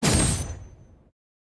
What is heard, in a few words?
aircutting whoosh